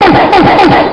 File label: oh oh oh